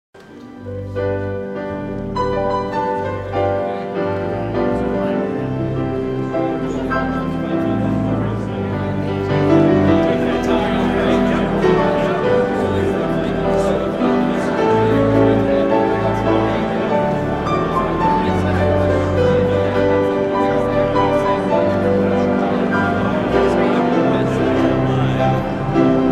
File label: Organ Piano Postlude; Night Of Song 2003